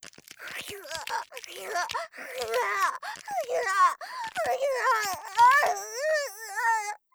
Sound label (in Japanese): "はぎゅぁ"